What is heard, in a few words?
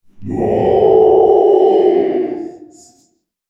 great demon chanting